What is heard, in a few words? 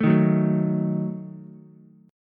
D sharp Diminished D sharp Ukulele; strummed; D#dim; JGuitar